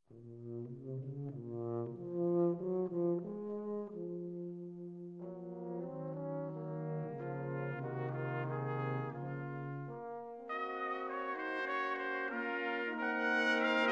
Brass Quintet Moderato; Quintet 1; Ewald

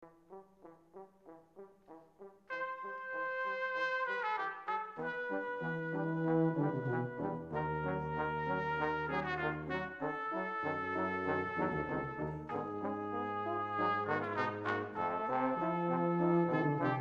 Brass, Allegretto

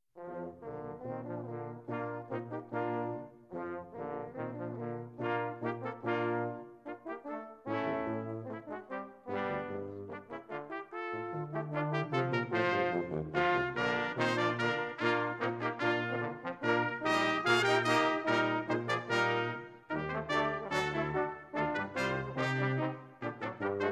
Brass Quintet Allegro; Quintet 1; Ewald

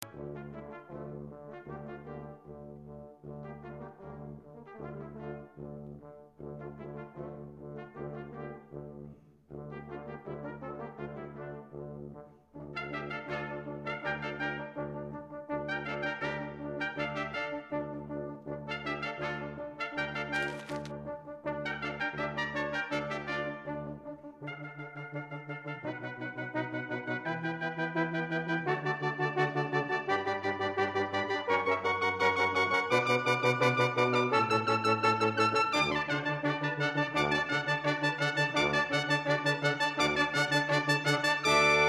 Brass, Allegretto

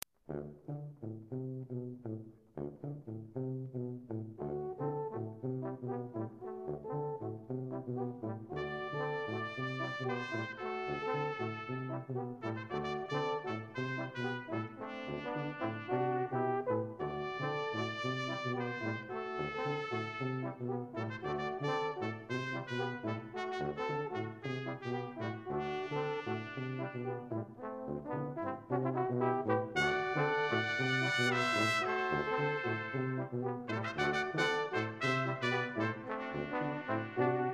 Brass

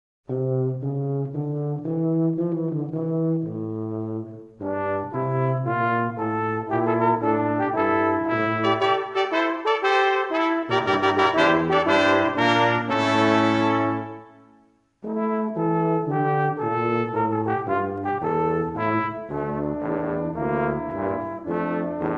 Brass, Morning March

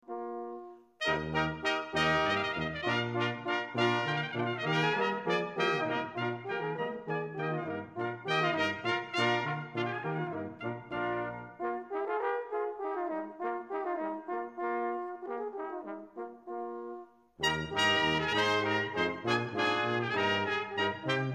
brass, allegro vivace; Water Music; Handel

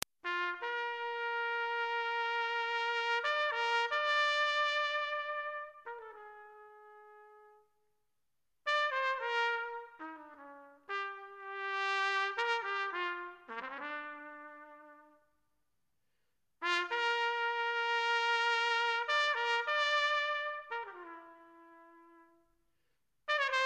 Brass, Amazing Grace

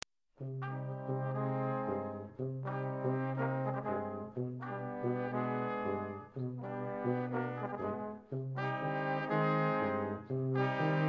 Brass

